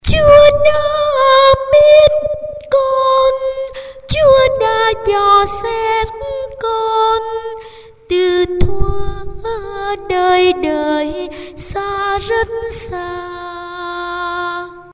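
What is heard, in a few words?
soprano alto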